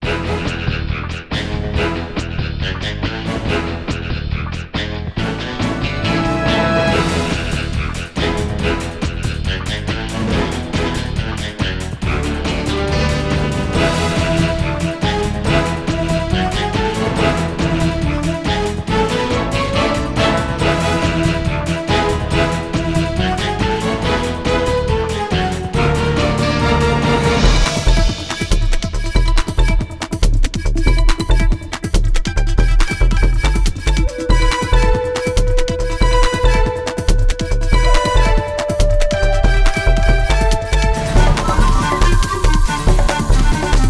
Confident Intense; Xiaolin Showdown; PS2

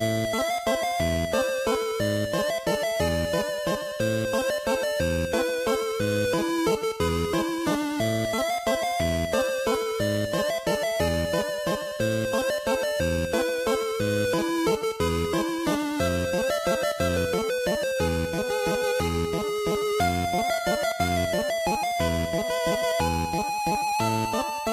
Music Dungeon Witch; save me mr. tako tasukete tako san switch windows gamerip 2018

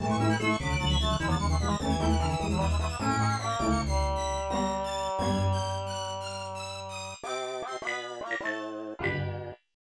waltz; pac man 2 the new adventures 1994 snes

